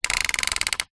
leisure toy car push wheels click

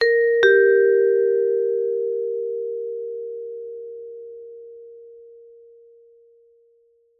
doorbell chime ring; zapsplat

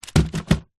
present wrapped box fall over; zapsplat

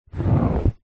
household fabric storage box drag on carpet

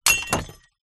impact glass smash break

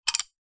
stationery pencils in hand clutch; office

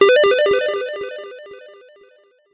multimedia game sound positive action tone; zapsplat

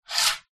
coin slide surface

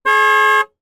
car horn beep med blast

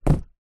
foley cardboard sheet drop down on cardboard box

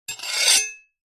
knife blade scrape draw metal fast

